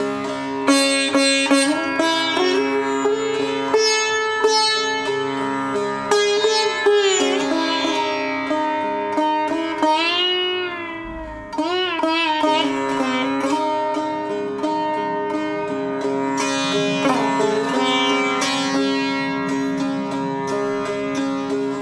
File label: Sitar; سه تار